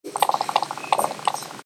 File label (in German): Knatternder Autofokus; sigma 35mm f1.4 dg hsm l-mount art; praxischeck